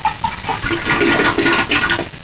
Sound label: toilet